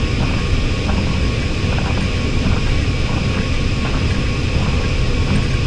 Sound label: boiler, ambient; Source Engine; tf